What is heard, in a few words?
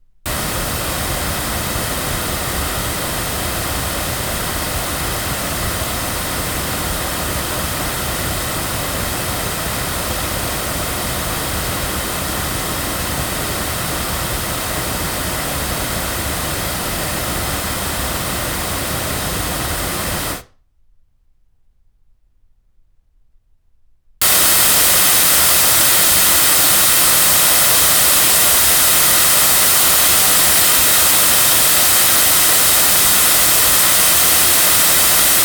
loudspeakers; Lauten Clarion; microphones